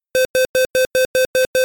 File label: Launch Recycle